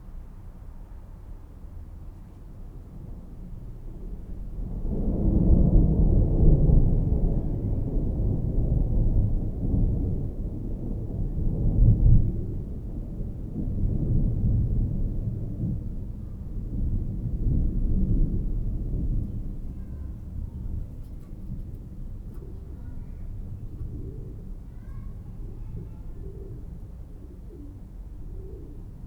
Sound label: distant thunder; 99Sounds; Rain And Thunder